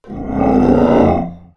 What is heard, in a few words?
Scream; The Elder Scrolls III - Morrowind; fabHulk